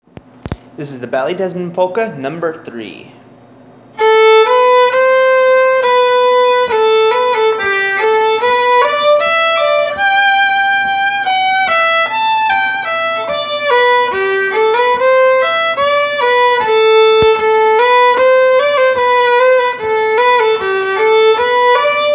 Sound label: Fiddle; Ballydesmond